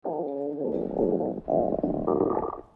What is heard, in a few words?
stomach growl